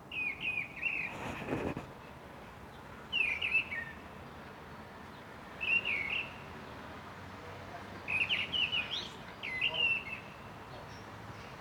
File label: thrush